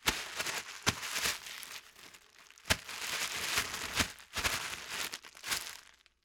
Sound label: Ruffling Plastic; Fairlight Sound Lib